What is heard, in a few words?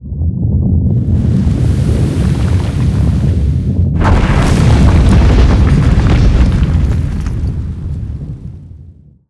speaker library floor